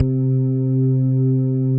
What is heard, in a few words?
PLUNK ORG C3; Greytsounds; Scott Peers Sound Engineering